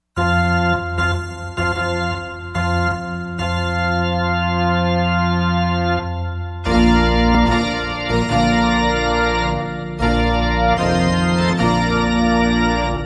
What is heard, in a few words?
Here Comes the Bride (Electric Organ)